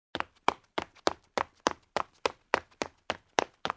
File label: badger run; 2fast2curious